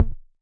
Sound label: nasty stormfront; Nasty Stormfront Electro FX